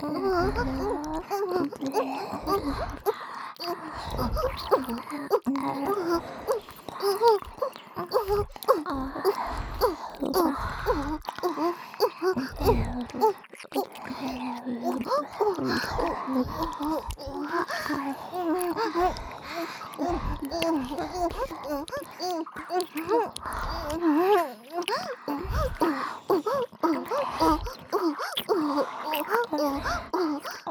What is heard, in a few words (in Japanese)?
オホ喘ぎ ＋ 両耳元 指しゃぶり; おまけループ（オナサポ）; 3WAYループ; SE無; チンカス